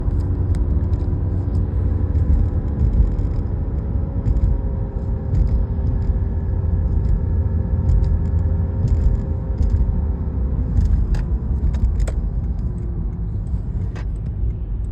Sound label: 2008 lexus is250 weird sound only when driving; Lexus Forum; Sights N Sounds